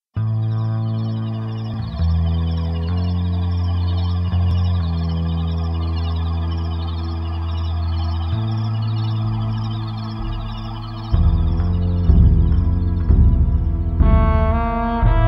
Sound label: Dim Sum Raga